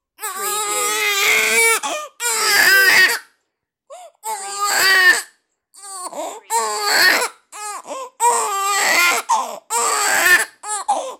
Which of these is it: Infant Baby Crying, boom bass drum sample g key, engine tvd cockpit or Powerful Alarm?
Infant Baby Crying